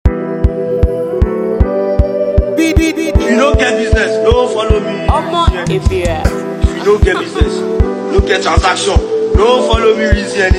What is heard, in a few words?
Money Mood Beat